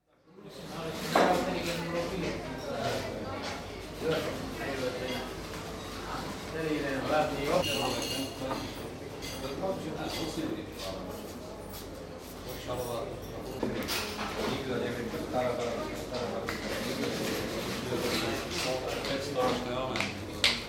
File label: Self-service cafe, Bratislava, some distinct speech (Slovak), footsteps, general noise; Restaurant; Czech; BBC